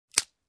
Cracking Stick

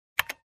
Keyboard key press

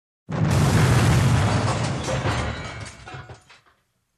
Explosions with scattered debris

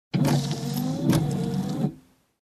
Car Windshield Wiper

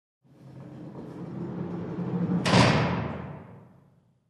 Warehouse Sliding Doors close

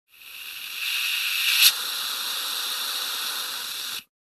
Refilling Tire Pressure

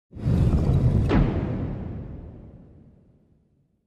Warehouse Door close

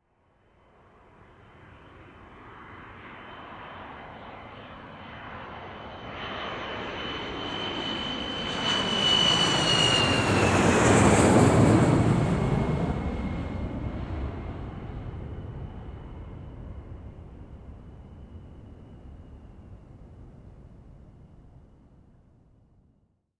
Commercial Aircraft Taking off whirring sound

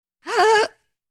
Frightened Woman

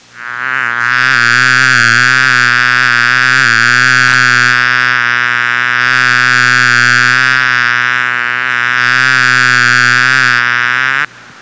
Hornet buzzing

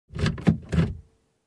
Unlocking Car with key

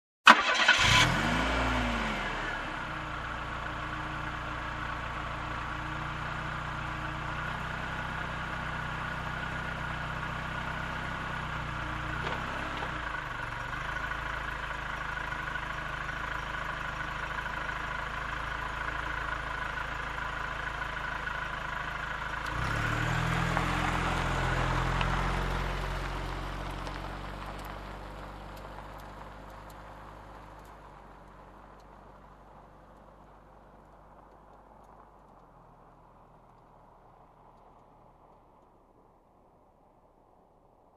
Car Engine ignition drive off

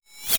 Electronic Button Sound

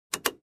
Cabin drawer lock click sound

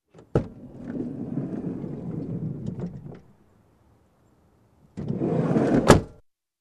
Opening and Closing sliding door on car

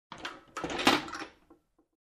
Closing compartment

